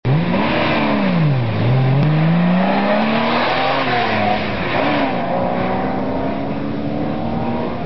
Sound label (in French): Ferrari Testarossa acceleration